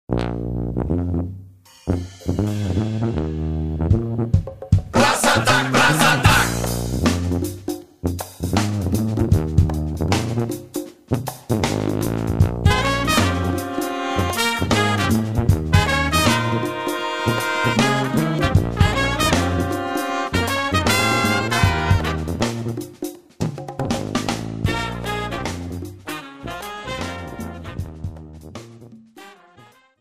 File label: brass